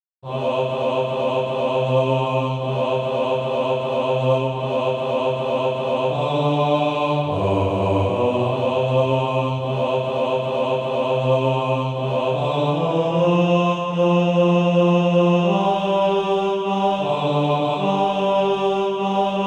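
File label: bass, SATB Practise Music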